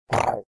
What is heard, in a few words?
Croc short